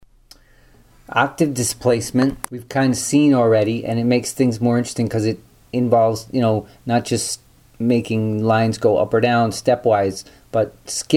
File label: octave displacement; summary of linear harmony